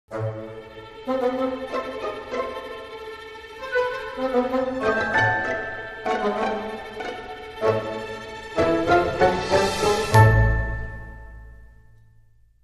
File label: Dramedy Sting